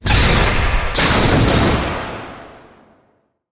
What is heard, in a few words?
Hard Double Crashy Blast Impact